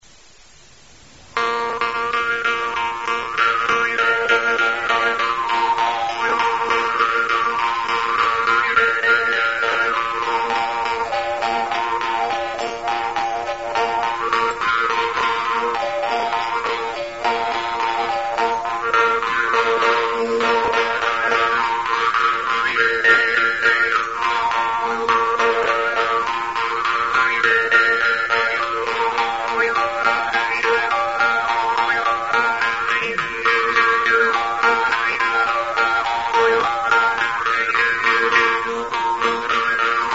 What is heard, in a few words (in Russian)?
vargan; Tuva Mongolia; yeroshev; ерошев